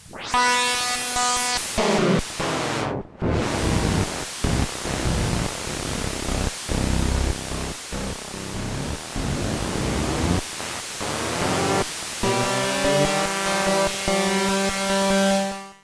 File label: noise pulse 74